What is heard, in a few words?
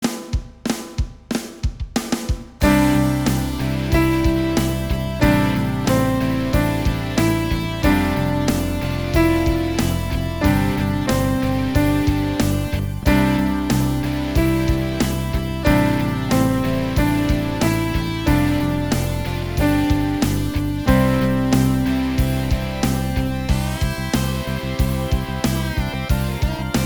Garage Band SLOW